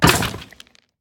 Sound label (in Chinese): 凋灵骷髅受伤声